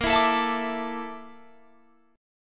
A sharp Major 7th Flat 5th, down

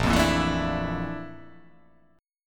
A sharp Major Sharp 11th

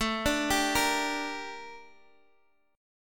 G Minor A, chord; Gm/A arpeggiated

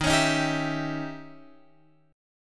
D sharp 7th Flat 9th Guitar Open F; D#7b9; strummed

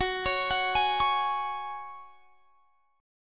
F sharp Suspended 2nd, guitar; arpeggiated; x,21,23,23,21,21

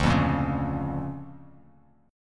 D Minor Major 7th Sharp 5th, D, Bass Standard; 10,8,8,6; DmM7#5 strummed; JGuitar

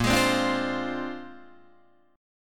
A sharp 9th A sharp, Guitar Open D

